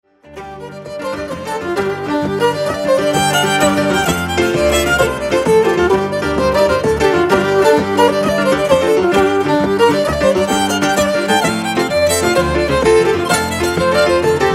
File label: Jigs